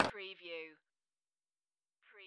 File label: Pinball sound, ball hit wall